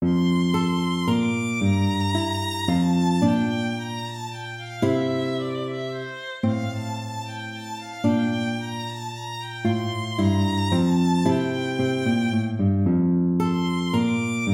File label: minuet major